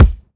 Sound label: sharp steel kick drum sound c key